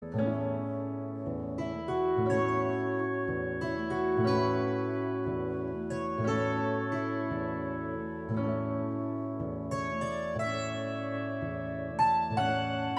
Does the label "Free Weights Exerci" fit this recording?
No